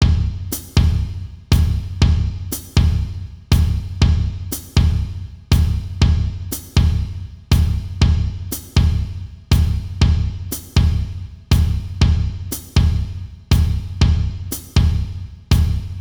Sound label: Baiao 60 bpm